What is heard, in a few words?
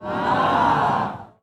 aah; pond5